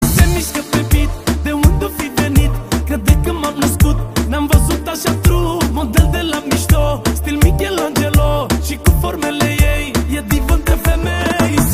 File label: beat